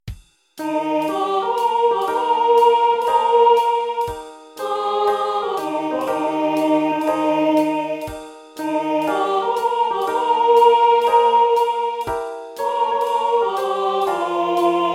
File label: swing alto instrumental